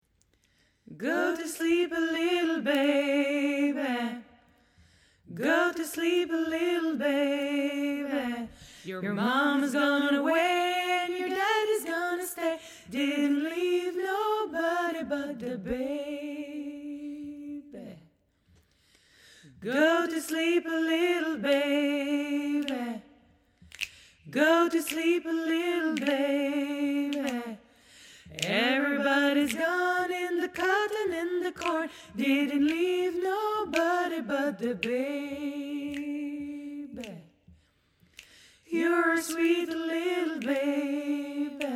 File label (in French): démo chanté